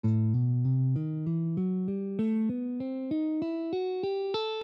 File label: A Roumanian Minor, guitar